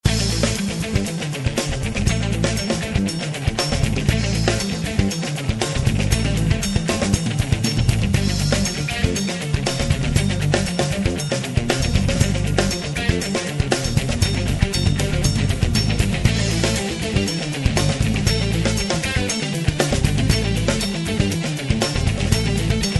Fusion, workout music

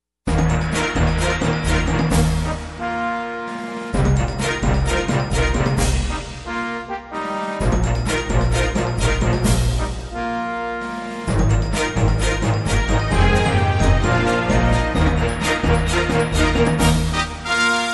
Dramatic, corporate music; business music

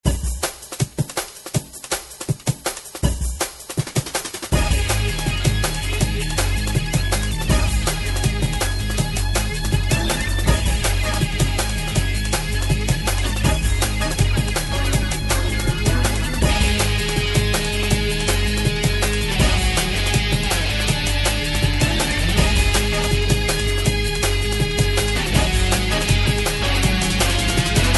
Techno Rock, workout music

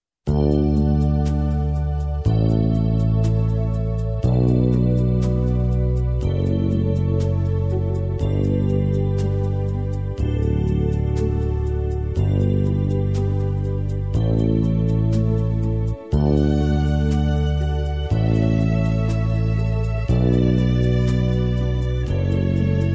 Pachelbels Canon, classic wedding music

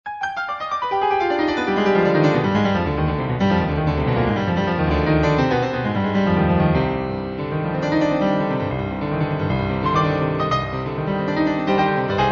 Revolutionary Etude

